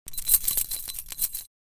Bunch of keys handling and jingling

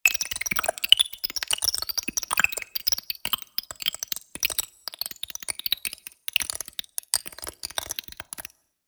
Futuristic text generation medium

